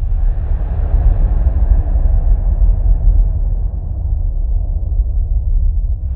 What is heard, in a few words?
spatial bubble idle; jupiter